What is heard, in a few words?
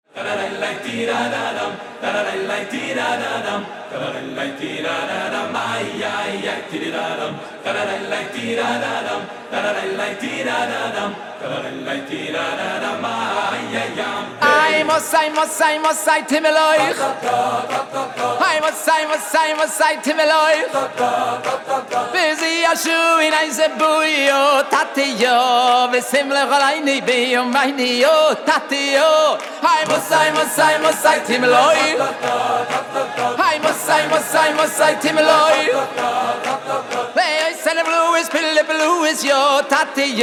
acapella